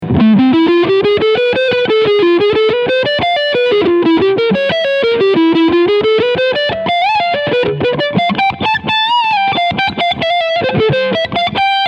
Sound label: PRS SE Mira Overdrive Neck PU